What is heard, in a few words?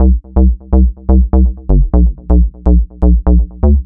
C 124, Synth Loops 124bpm; Zero-G; Soundclash Nu Dance Electro; Acidized